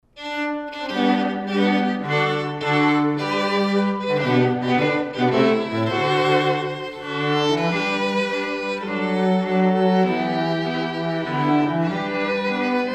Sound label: String Quartet